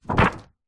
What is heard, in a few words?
hospital xray sheet set down on surface